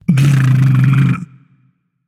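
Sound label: non-verbal tongue; smite; atlas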